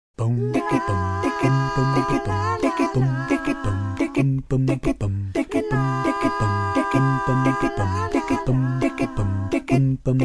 goofy vocal groove

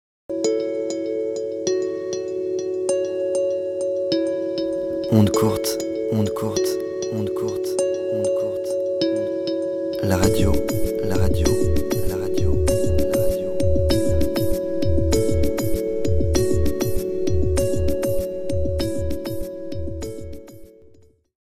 jingle

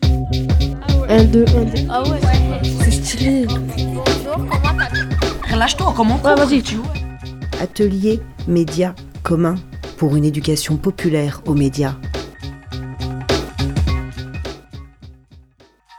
jingle c est style; MCO

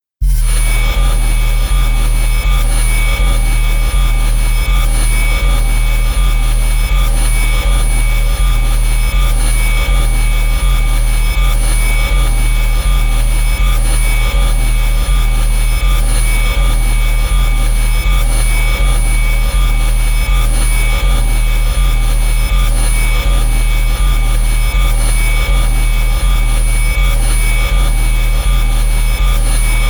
Propellant Pump; British Experimental Rocket Group (BERG); BERG 5

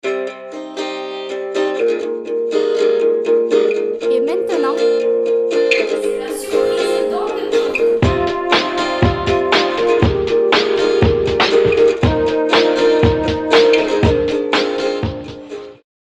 jingle surprise; la surprise

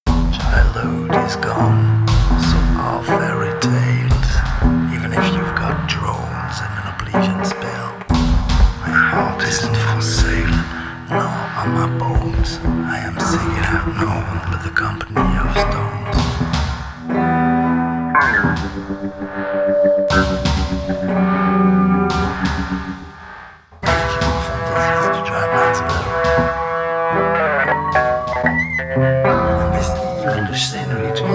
Strange reggae

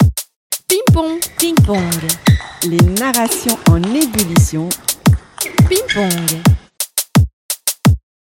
jingle; ping-pong 2022

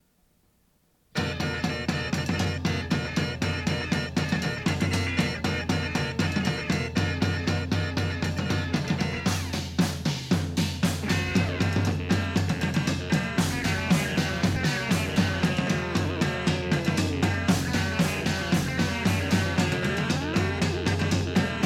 worldmusic

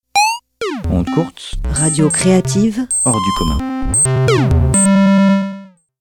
jingle

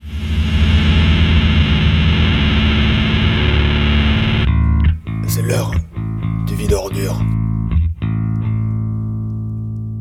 jingle; vie d'ordure

